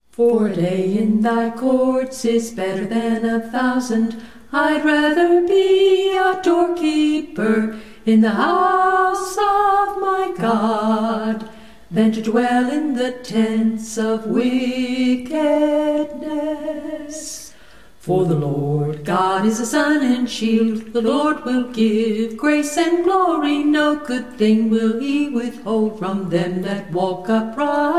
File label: a capella scripture song; psalm